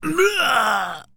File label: Male Pain, Zombies; zps; Male1